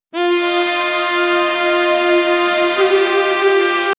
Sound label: fanfare sound, ceremony